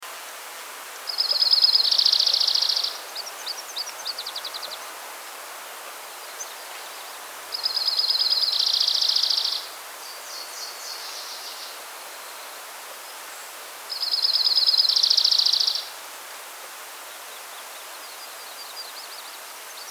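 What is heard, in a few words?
junco, Junco hyemalis; oiseaux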